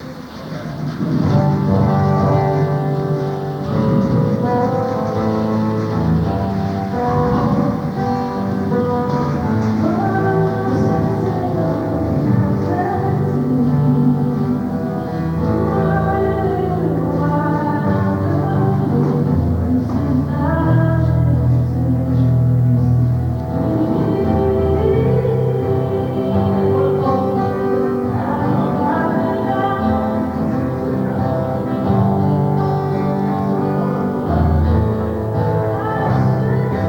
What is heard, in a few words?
soundcheck